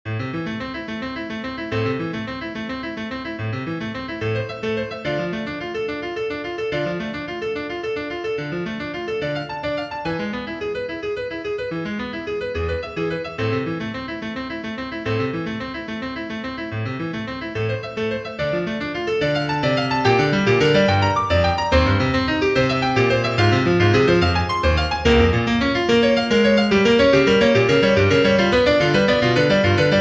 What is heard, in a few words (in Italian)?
preludi per pianoforte